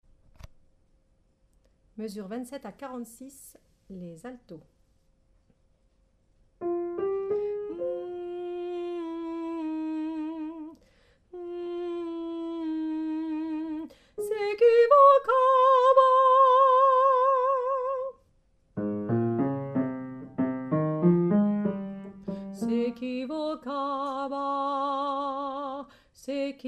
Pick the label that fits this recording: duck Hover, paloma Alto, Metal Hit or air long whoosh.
paloma Alto